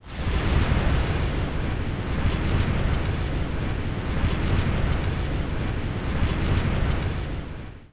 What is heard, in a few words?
prayer ambient